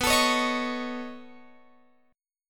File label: B 9th B down Guitar Open F; strummed